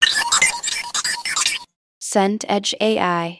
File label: data transfer